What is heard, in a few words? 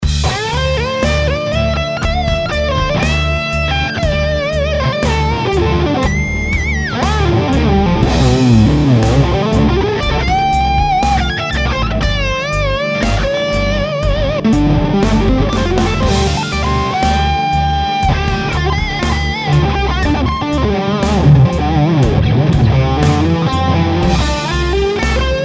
Lead